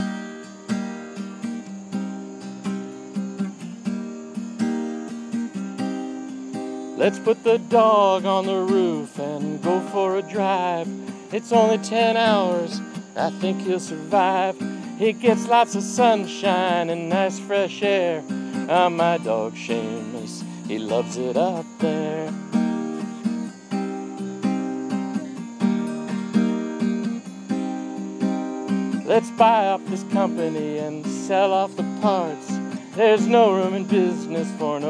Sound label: acoustic; my dog seamus